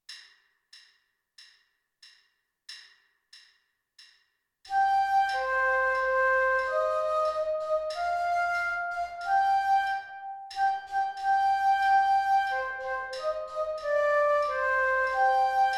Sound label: flute